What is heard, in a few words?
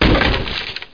brk wood; heretic ii demo